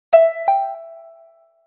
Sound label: notification